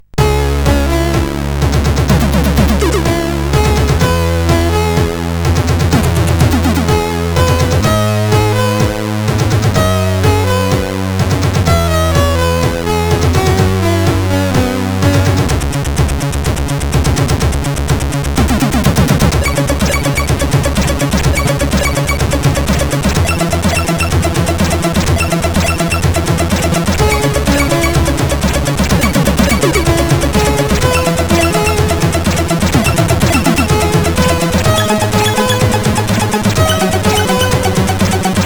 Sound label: remix, sid CSG8580R5